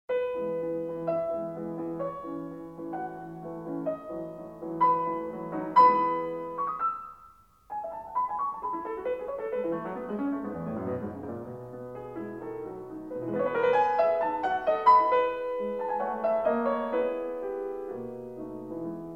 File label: Piano Sonata No. 9 in E major; Wilhelm Kempff; Beethoven; Piano Sonatas